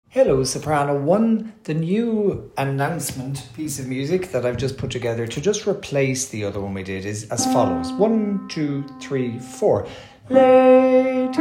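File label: Soprano 1; Announcement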